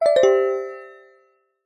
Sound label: multimedia notification mallet generic with slightly harsh resonating tone; zapsplat